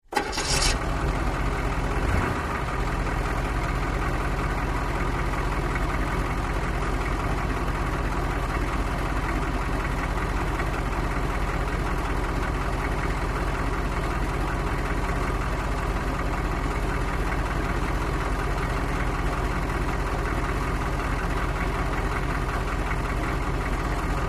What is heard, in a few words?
Humvee: Medium Start, Idle And Away.